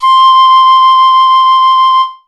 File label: E-Mu Proteus 2 Flute C5